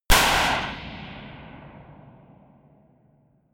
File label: gun slapping pop gun